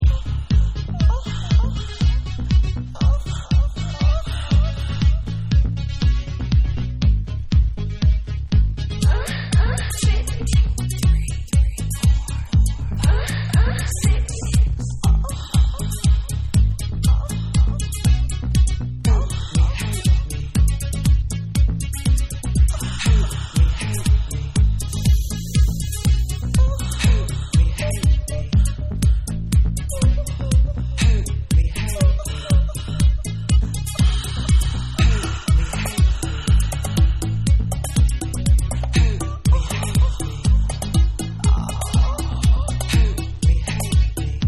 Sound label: Dub Mix